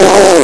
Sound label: pain; quake2